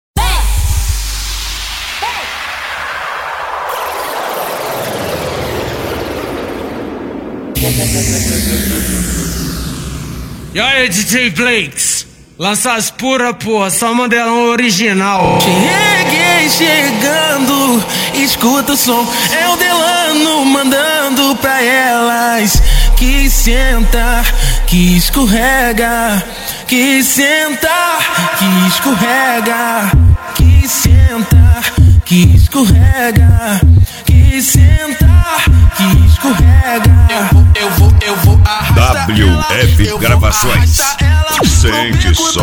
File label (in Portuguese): cd wf gravacoes esp funk mandelao 2023